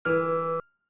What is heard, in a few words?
Piano Altered Resonance; Piano Nudes